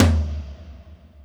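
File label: Tom Floor Wet, Alesis D4 drum machine; 300 Drum Machines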